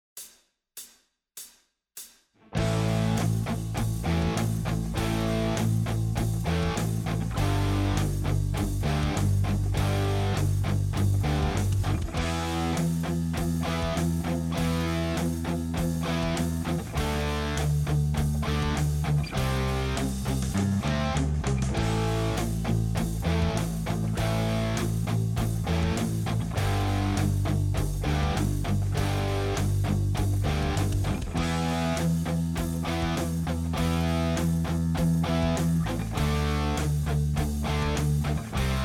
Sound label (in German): Begleitung